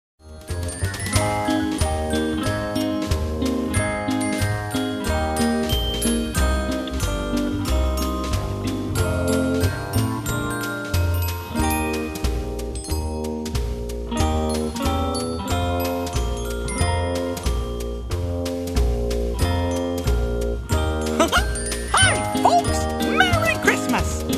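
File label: karaoke instrumental; christmas favorites; disney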